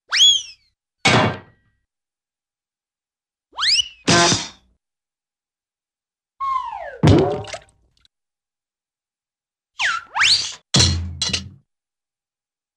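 Slip & Fall with solid Impact Cymbal Crash Slide Whistle, Cartoon; Hollywood Edge; Cartoon Trax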